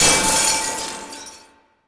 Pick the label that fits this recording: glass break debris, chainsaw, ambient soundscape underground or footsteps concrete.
glass break debris